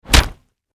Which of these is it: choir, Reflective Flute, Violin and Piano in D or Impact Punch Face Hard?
Impact Punch Face Hard